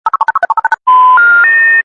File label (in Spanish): telefono sin cobertura sin senal